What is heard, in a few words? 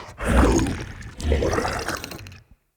Heal, Vocals; Characters; Enemy; Corrupted Sproutfolk